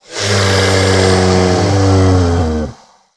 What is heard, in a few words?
worm dying, giant worm